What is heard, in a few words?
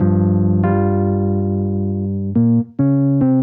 jazz keys ElPiano 140 B-line n Riff G